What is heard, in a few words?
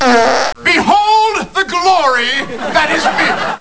fart